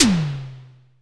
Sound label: Drum X Tom; Pearl Drum-X